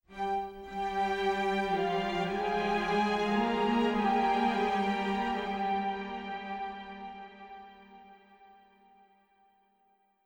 MUSIC strings; Myst III Exile

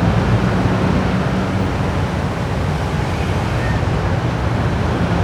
City Ambient Loop; Totaled; XBOX

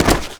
bail body fall; skater; Tony Hawk's Project 8; XBOX

